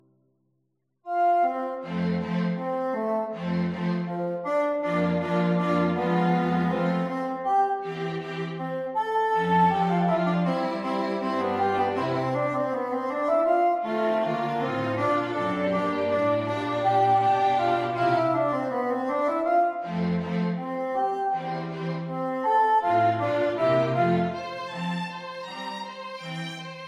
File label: Quartet